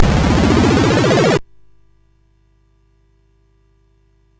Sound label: Dragonworld sid CSG8580R5